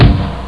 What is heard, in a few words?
tom tom; Sound Effects for Multimedia - Volume 1